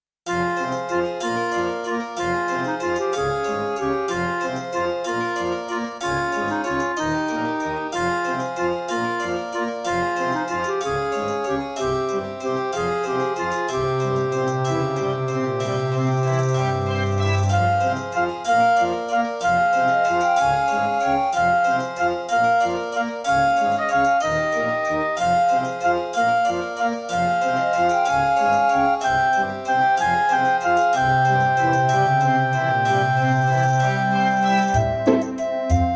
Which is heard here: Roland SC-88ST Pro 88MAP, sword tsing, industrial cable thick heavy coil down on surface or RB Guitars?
Roland SC-88ST Pro 88MAP